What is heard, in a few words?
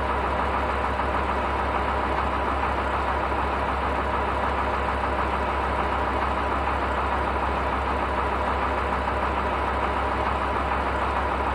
idle, vehicles cars; lwcars; gmc savana